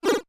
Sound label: Magical Rod; Use the Magical Rod